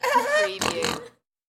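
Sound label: Stupid Snort Laugh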